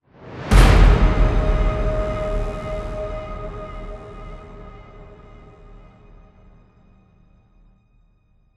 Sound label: Victory Sting; apex legends season 12; GunGame